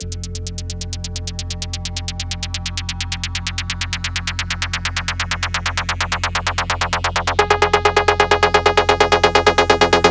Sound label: 130BPM Melody; VFH1 130BPM Motor City Kit